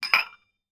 household 2 metal dessert bowls hit clang together